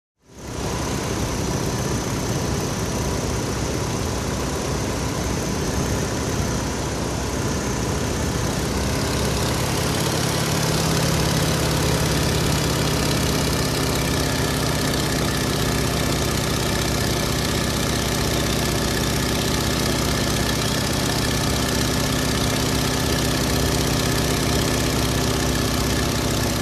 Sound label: Light Aircraft Airscrew Noise Near